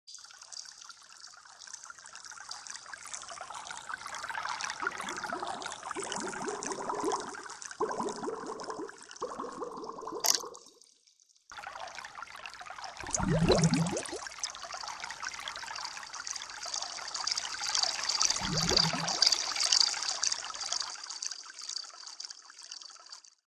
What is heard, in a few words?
mountain stream